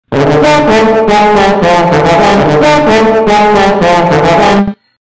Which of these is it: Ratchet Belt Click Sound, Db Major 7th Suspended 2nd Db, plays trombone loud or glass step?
plays trombone loud